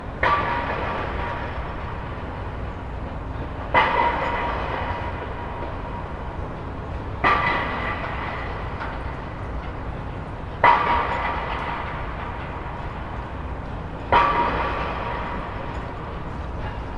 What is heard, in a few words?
Industrial Stereo